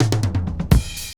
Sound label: 120 BREAK, DRUMS